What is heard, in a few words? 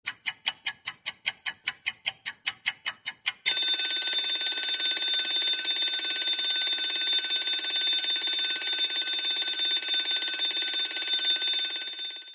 Tic Toc Clock Ring